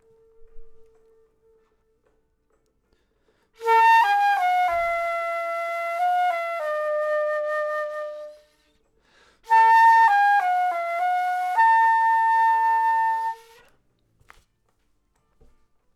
flute

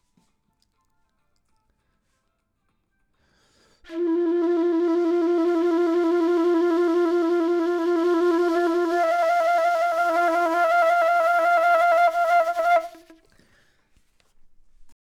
flute; logic

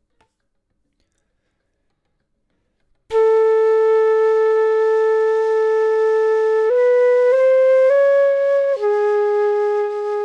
flute; logic

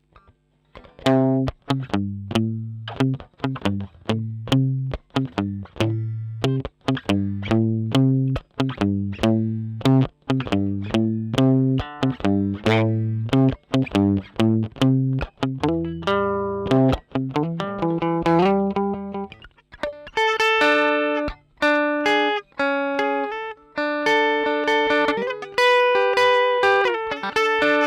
Delta Slapback; logic

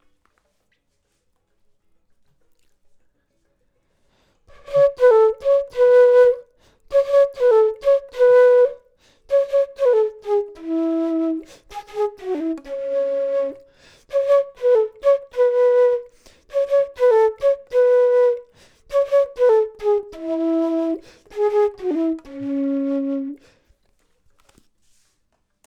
flute; logic

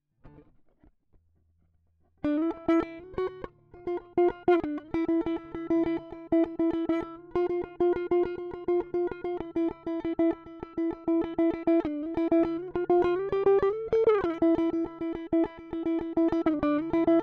Small Bright Combo

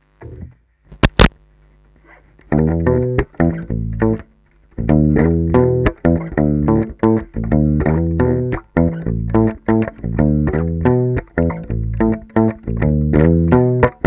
Hi-Fi DI

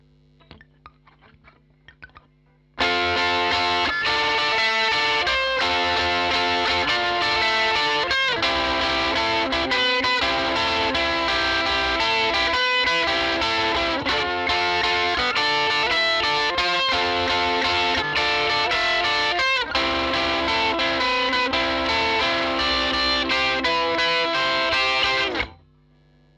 Digi Custom Vint

